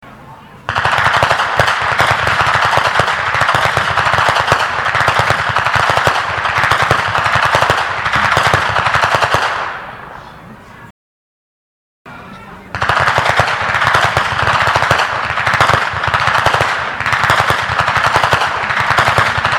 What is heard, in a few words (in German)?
Schnalzen; Perach; Ainringer Schnalzen